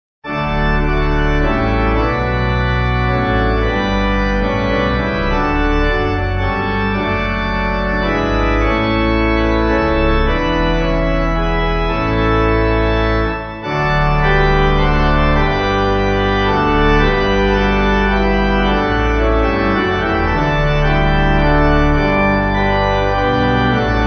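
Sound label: Pipe Organ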